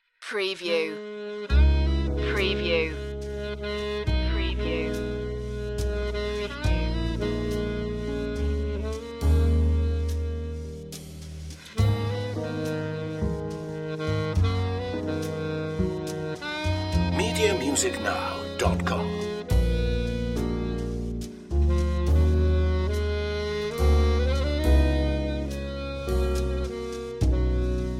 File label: morning ballad